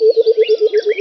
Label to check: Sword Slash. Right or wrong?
Wrong